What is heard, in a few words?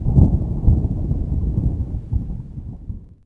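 thunder; UrbanChaos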